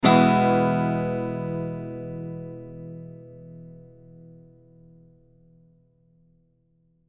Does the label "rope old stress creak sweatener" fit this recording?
No